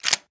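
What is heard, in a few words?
weapon sounds; zvuki oruzhija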